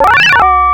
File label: coin in; Outrun; Arcade